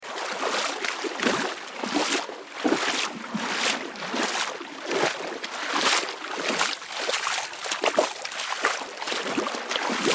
footsteps walking in water

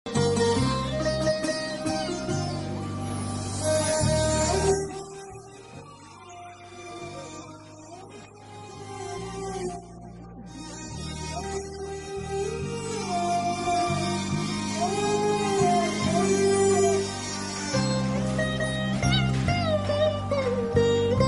Sad Music; Khadgam; India

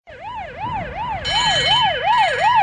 police sirens

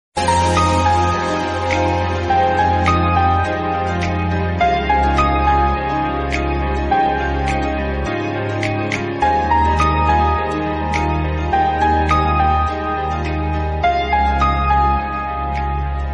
Instrumental

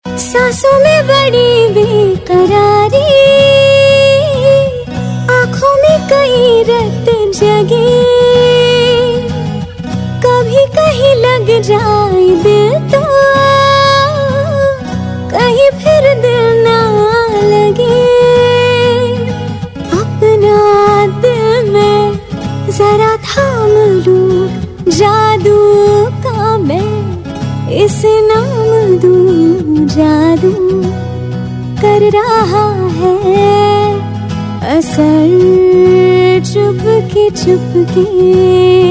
cute voice, ringtone

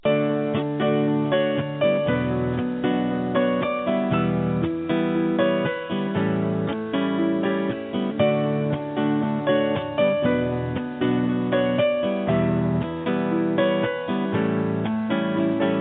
Relaxing Piano Flute Music

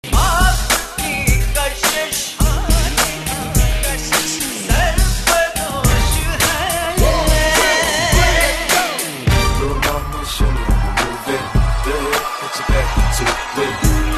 disco remix

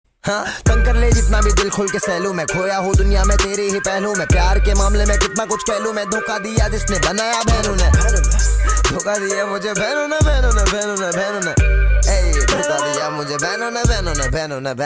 ringtone

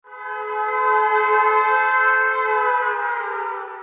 air raid, air raid siren sound effects

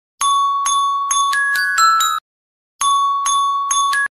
Vivo Mobile New Sms

